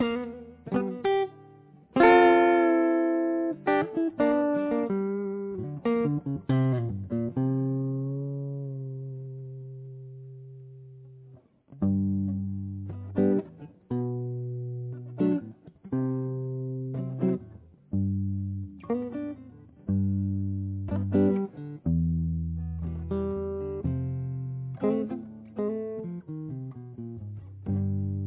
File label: Blues